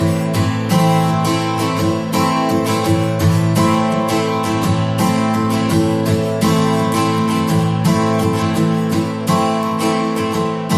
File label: Motivation Straight Ahead, No Drums